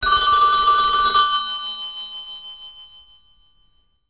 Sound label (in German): altes telefon